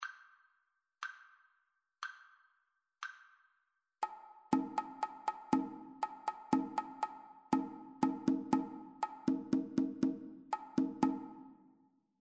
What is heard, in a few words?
two part rhythmic dictation; ear training